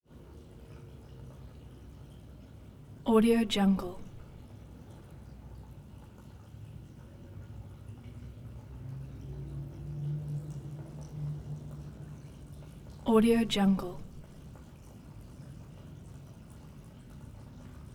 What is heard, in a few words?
storm drain presence; prosoundfx